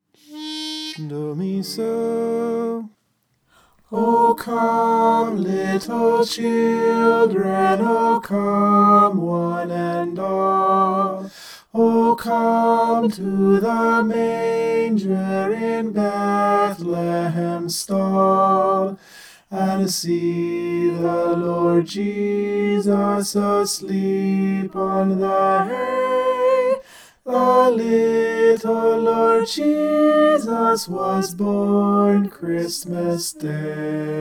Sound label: o come little children soprano